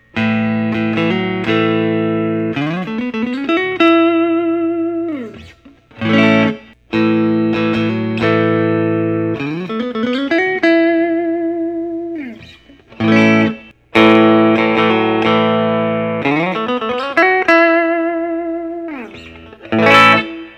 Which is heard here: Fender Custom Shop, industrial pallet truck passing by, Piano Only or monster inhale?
Fender Custom Shop